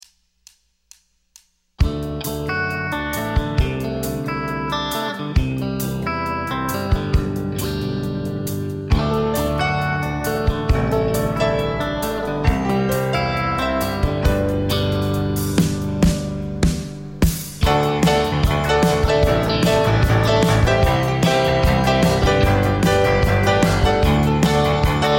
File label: Pink Noise